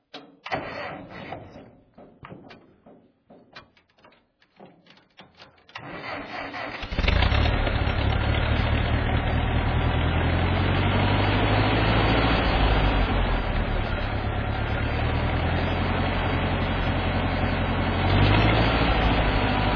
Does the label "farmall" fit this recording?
Yes